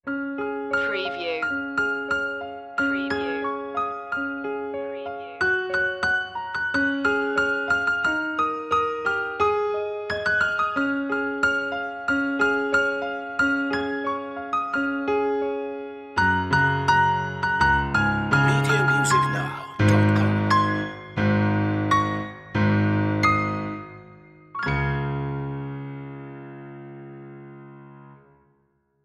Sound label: jingle bells piano loop end; media music now